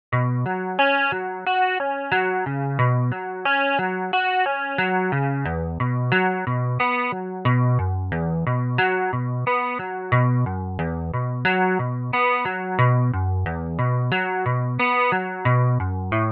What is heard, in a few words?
raw guitar